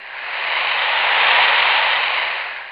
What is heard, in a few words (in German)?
SCHNEE